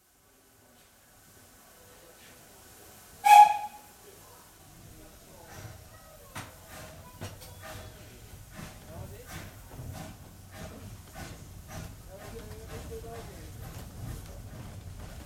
Steam Train starts into constant run, passes through tunnel, slows & stops (Compartment acoustic); BBC